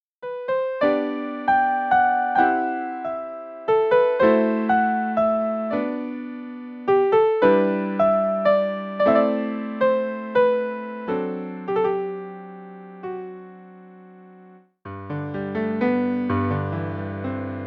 German Folk Song; arranged for solo piano; Kommt ein Vogel geflogen; Arranged by Dias Karimov

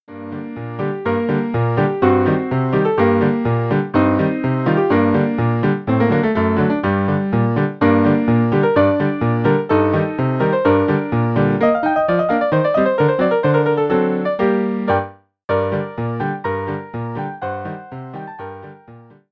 Hungarian Dance No. 5 for Piano Solo

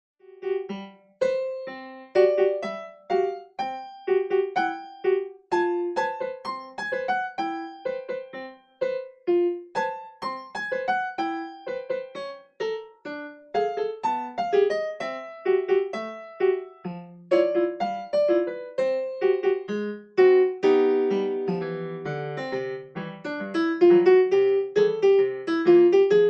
German Folksong; arranged for solo piano; Alle meine Entchen

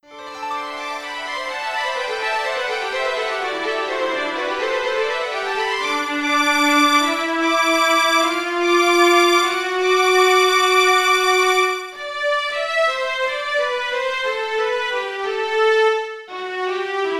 Waltz Caprice Two Violins and Piano

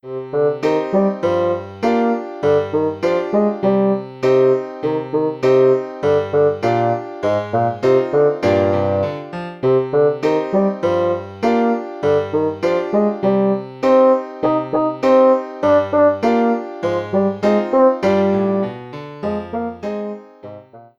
In Traditional Style Bassoon and Piano

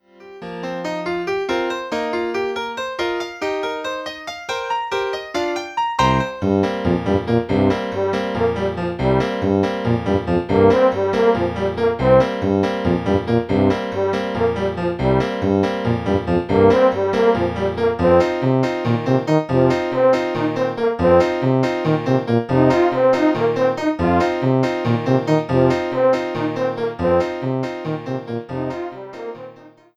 Intermezzo for Horn Tuba and Piano

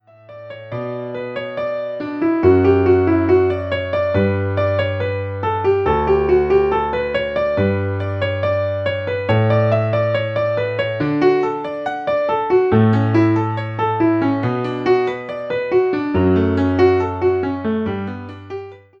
Canon in D Major

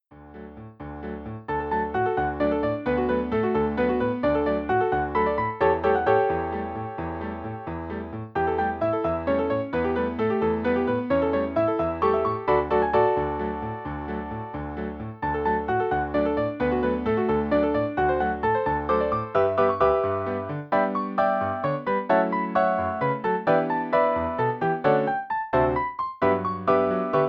Dance for Piano Duet; four-hands

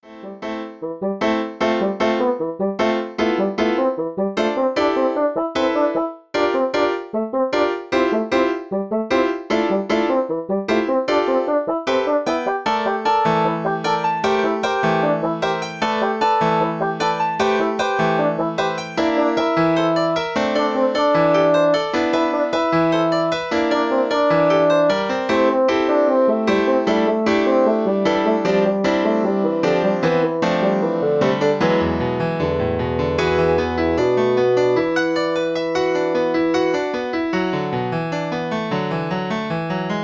Bassoon and Piano; Allegretto

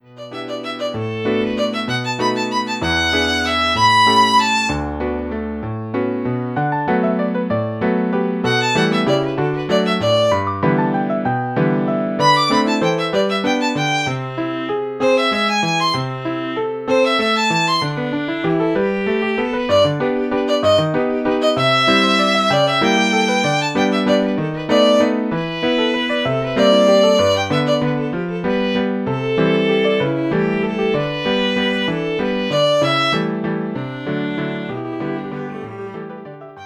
Forest Song Violin and Piano; Arranged; Arranged by Airat Karimov

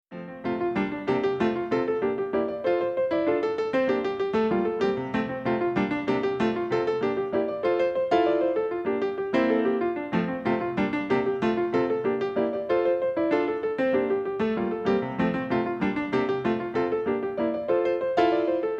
Scherzo Pizzicato

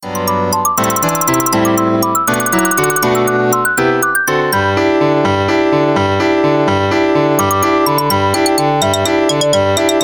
Dance Xylophone and Piano

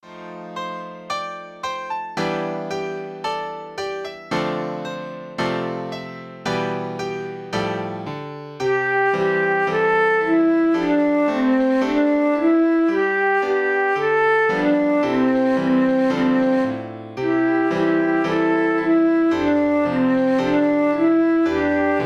Little Song Two Recorders and Piano

